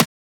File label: Transient; FLPRO